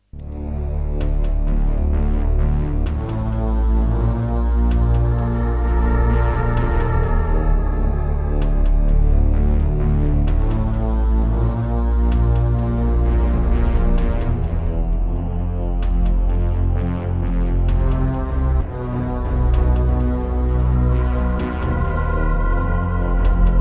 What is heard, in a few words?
Briefing; Serdaco McQub; Descent II